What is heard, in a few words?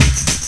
Meat Bdrum; Urban Shakedown